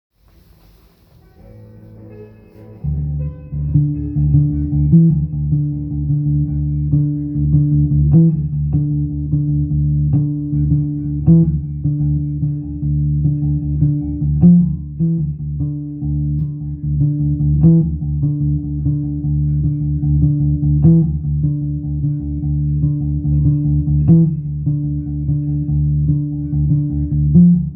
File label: battle music